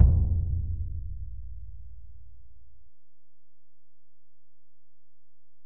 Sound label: Ac BD, Alesis DM-PRO; 300 Drum Machines